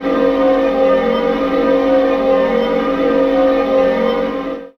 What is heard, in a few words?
sfx sci fi; Masterbits - Switch FX